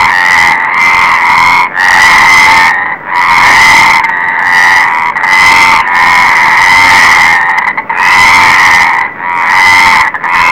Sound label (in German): laubfroschkonzert; biotop; Froschkonzerte